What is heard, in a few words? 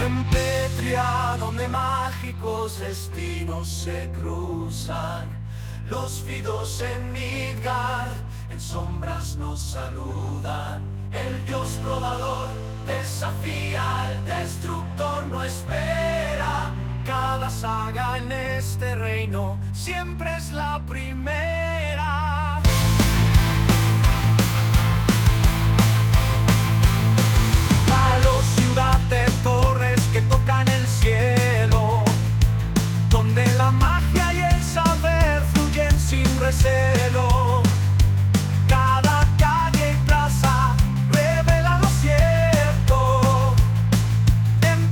music